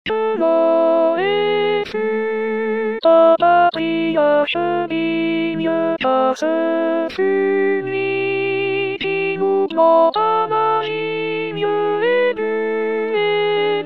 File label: alto